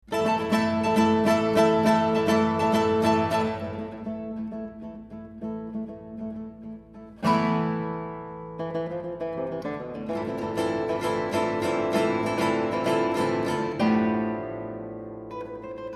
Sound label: samba